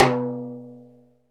PRC TIMB., Timbales; Northstar - Drumscapes Roland; Bonus Perc

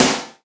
SD, Yamaha RY-10, Drums

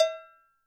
bell, Zoom MRT-3B

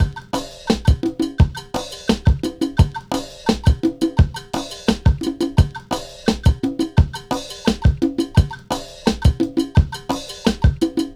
Percussion, cinematic funk; Sonic Foundry (Sony Creative Software); Cinematic Funk Urban Tone Poems

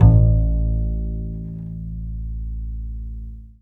BASS LP, Drum & Bass, 150 BPM; Ueberschall; Drum & Bass Flash Trackz

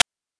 PITCHED NOISE; NES DPCM; MIDINES

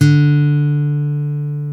GTR D3, Dual Guitar, Ac 12+; Club-50 - Foundations

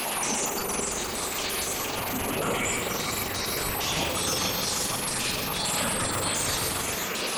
Moving Noise 130, Tempo Loops 130bpm; stereo toolkit samples; Moving Noise C

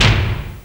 SFT TOM FL; Back In Time Records; Big Bang

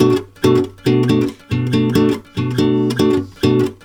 B MIN, Brazil Bossa; USB Soundscan; AKAI

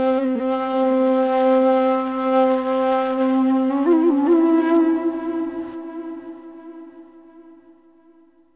HORN, AMB SOUNDS; GABES HORN

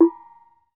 KETUK, Bronze Gamelan

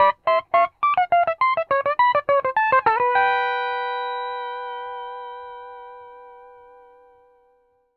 105 R, PD LOOPS

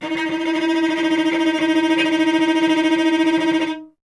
STR VC D#2, Cello; Miroslav Vitous; Solo Instruments VOL-1

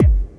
BD hip, Dance Mega Drums; Best Service

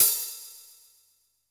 Hi-Hat Open, Standard Kit, Yamaha PSR-E323

